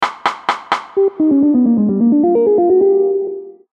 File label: Ⅱ Ⅴ Ⅰ Phrase